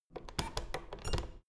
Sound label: door plastic handle use creak soft